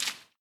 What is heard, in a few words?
water footstep